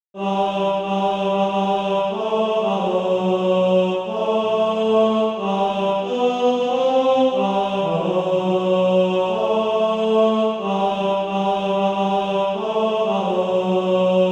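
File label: tenor, Practise Music; Flee as a Bird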